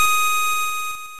Goron Dance; Link doing the Goron Dance ("A" pose) (also used as the begin-fight bell in OOA); Zelda Oracle

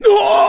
KO; Ganondorf knocked off the stage

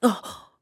Lose Grip; Link; BOTW; Breath of the Wild

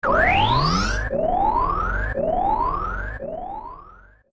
Mirror Shield Absorb; The Mirror Shield absorbing Twinrova's blasts (edited to fade out)

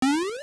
Enemy Jump; An enemy jumping

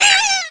Peahat Drop

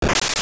Rock Shatter; A rock (or pot, skull, etc.) shattering

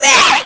Bubble Hit

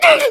Hurt; Yuga getting hurt; Zelda

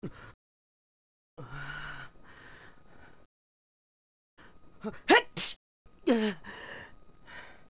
Sneeze Full; Adult Link

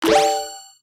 Pause Menu Equip; Equip an item (Recorded)

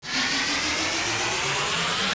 Power Up; Receive a power-up

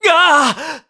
Shield Break; shield breaks; Link's shield breaks; Skyward Sword; zelda

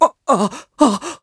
Link Panic Catch; Link panicking to catch Zelda; Skyward Sword

